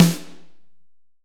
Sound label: HALL SN, HALL SNARE